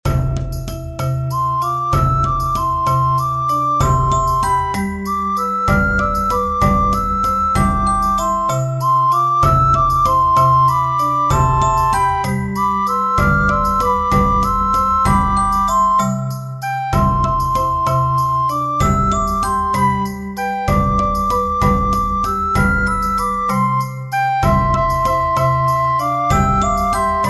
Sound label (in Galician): Conxunto Instrumental; Non chas quero